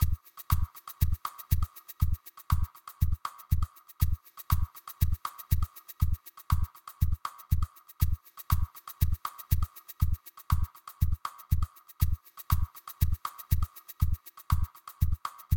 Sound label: Tension Curve; nova 111; ps vita